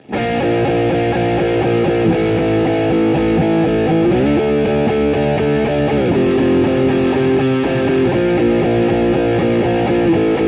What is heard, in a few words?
lead middle; custom77 cs4